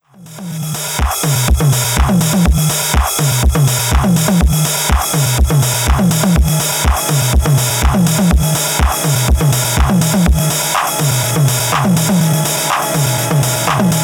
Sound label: Drum Machine